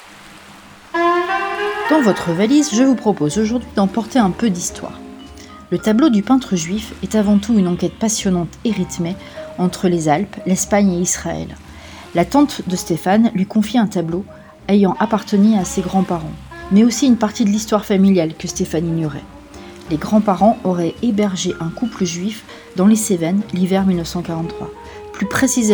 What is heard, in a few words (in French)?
tableaux pad